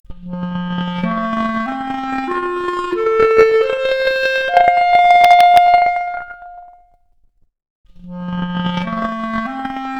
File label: Clarinet arp, Stereo output noised; 4bit